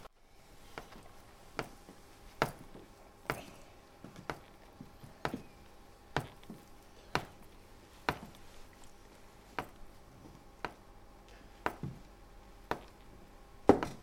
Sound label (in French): vand pluie pieds